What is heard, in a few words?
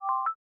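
bell glitch C major; bespoke - Floral v.2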